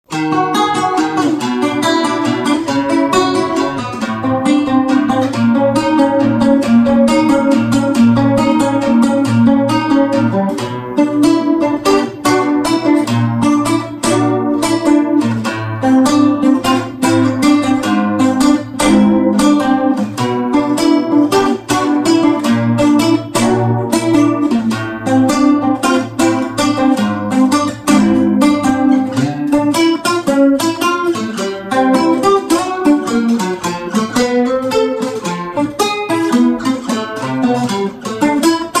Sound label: acoustic version